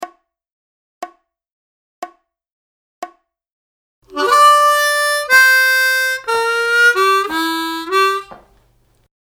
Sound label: Easy Glissando Licks Lick, harmonica